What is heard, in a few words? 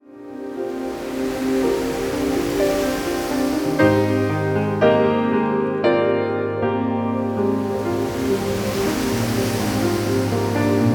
sea